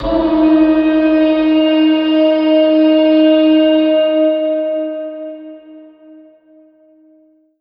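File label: Reverb Processed, alarm siren samples 125bpm; musicradar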